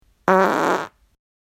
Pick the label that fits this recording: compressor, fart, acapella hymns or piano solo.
fart